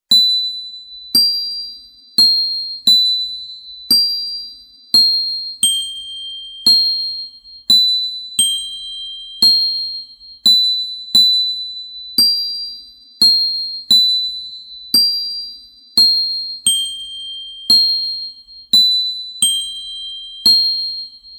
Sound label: glock only; talus gendarmerie